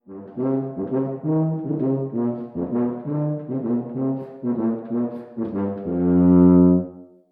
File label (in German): Basstuba